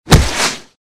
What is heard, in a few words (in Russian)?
padenie i nebolshoe skolzhenie na listve; Звуки падения человека